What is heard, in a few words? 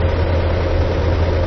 American car Idle; 暴力赛车